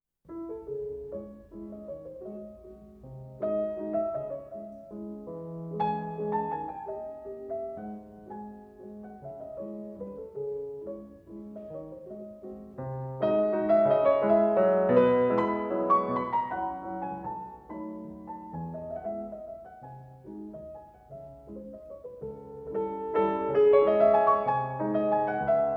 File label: Mazurka No.41 in C sharp minor, piano